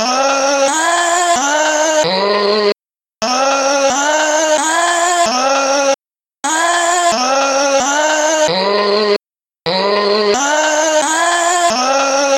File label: chime